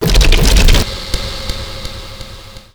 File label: claw strike; svencoop